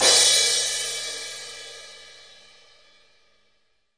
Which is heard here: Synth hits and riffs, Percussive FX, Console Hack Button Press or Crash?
Crash